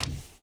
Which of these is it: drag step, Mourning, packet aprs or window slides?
drag step